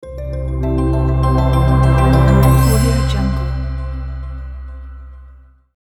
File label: dreamy logo reveal